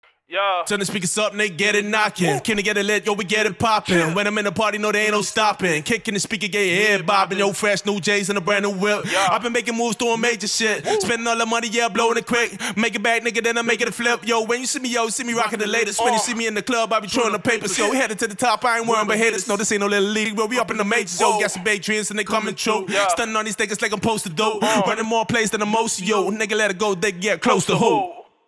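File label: hip hop 139 B flat minor, Acapella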